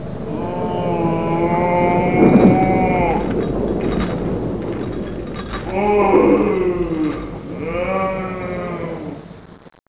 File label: horror ghosts